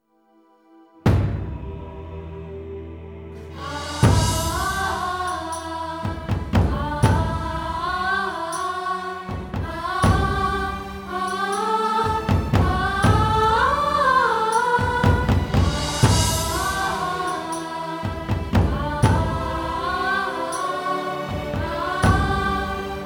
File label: Sad Version